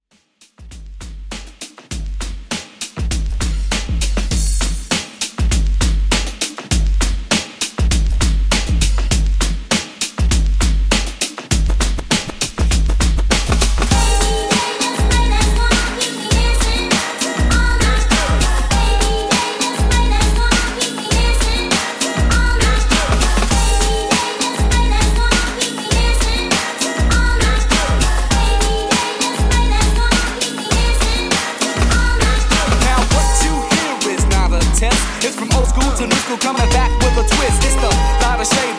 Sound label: Ext 100Bpm Clean